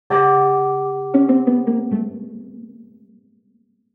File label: Failure; Rollgoal